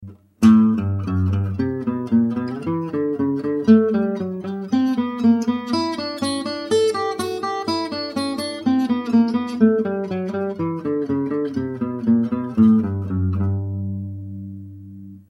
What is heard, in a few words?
arpeggio low high, guitar